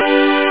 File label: synth strings